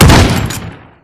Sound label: Rifle